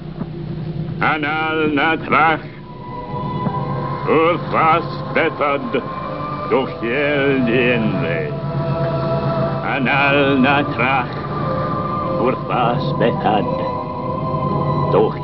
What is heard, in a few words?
chant; Merlin's chant.